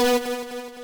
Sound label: lead2 b3